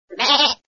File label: Beeee